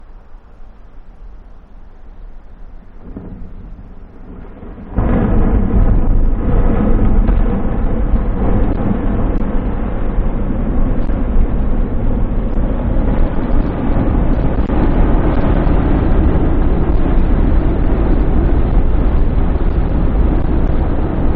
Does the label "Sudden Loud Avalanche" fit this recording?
Yes